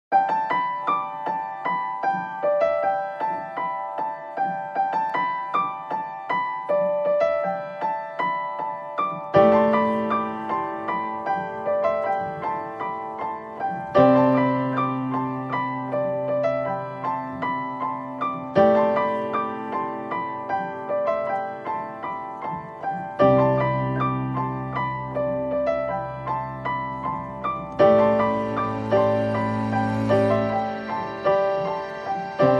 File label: No Lead Cello